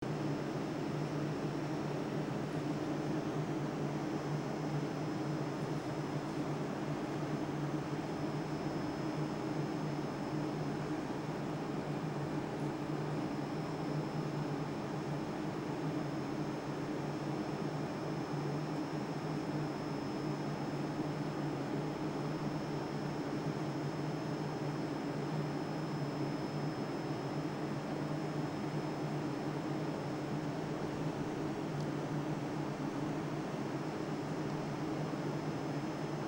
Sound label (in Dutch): 1843 stilstand ruisende ventilatie en licht gezoem